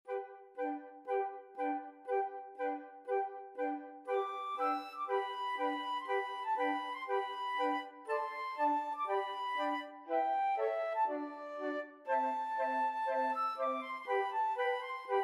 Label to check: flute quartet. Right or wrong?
Right